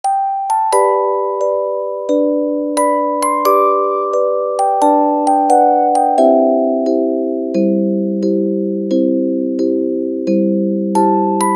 orgel

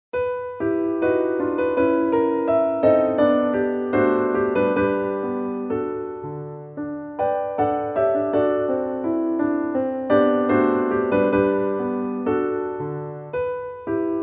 piano; schumann; 子供の情景 第１１曲「こわがらせ」; シューマン

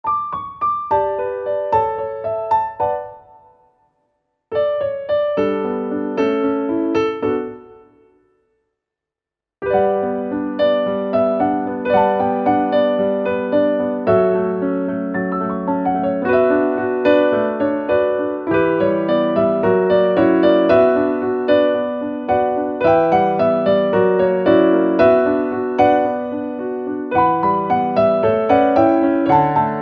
piano; 甘酸っぱい 切ない メロディアス; 切ない！もう一粒！ 果実のようにみずみずしい旋律。

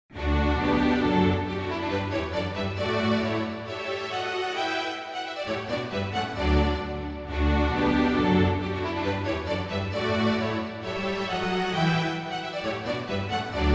symphony101 4 orchestra; haydn; 交響曲第101番「時計」 第4楽章(冒頭部); ハイドン

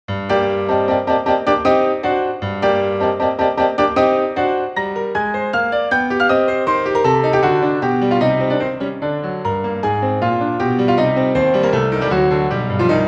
piano sonata, piano; ピアノソナタ第２番第３楽章; schumann; シューマン

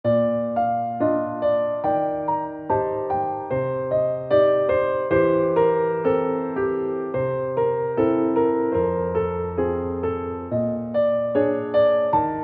piano; schumann

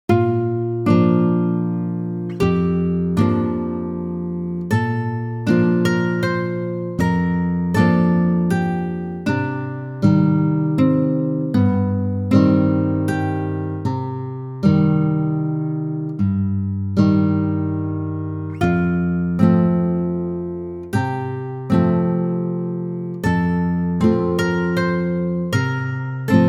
guitar; アコースティックギター音色でクラシカルな雰囲気を表現。

